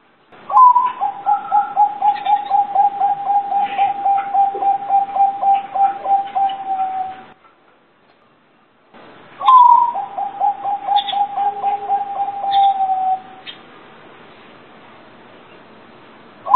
Suara Perkutut Lokal Manggung

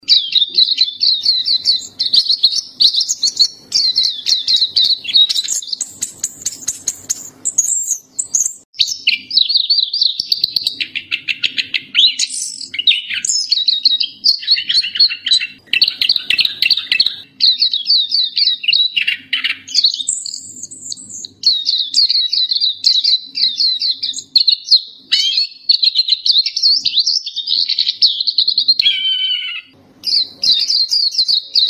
Suara Burung Pleci Gacor Ngerol Panjang

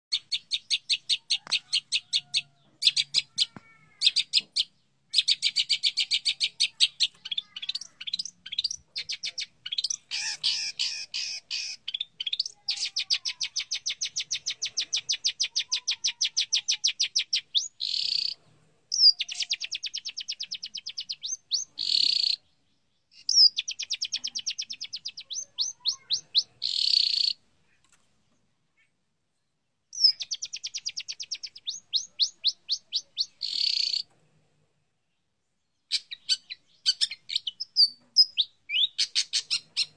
Suara Burung Cendet Gacor Durasi Panjang

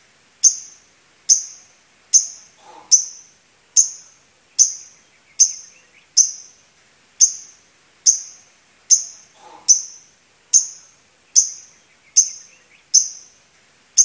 Suara Burung Lovebird Ngekek Panjang

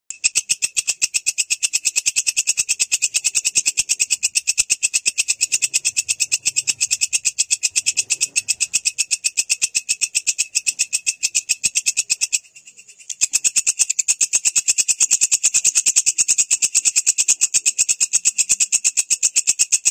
Suara Belalang Kecek; untuk Masteran Burung

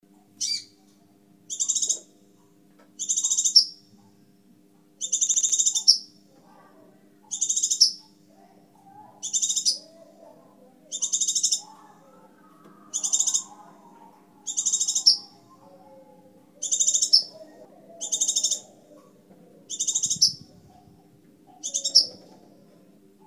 Suara Burung Kolibri Sepah Raja

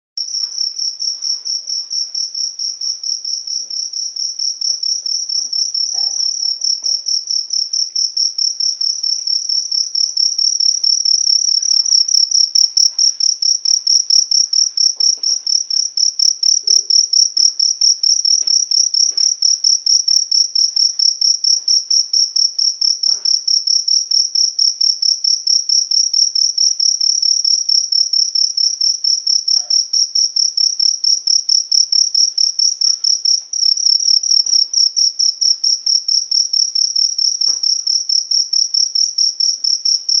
Suara Jangkrik

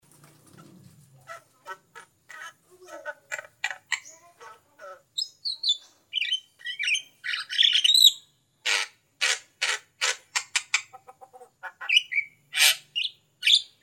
Suara Burung Jalak Kebo

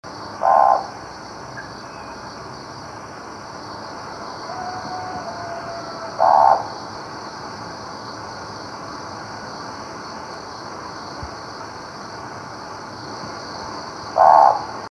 Suara Burung Hantu

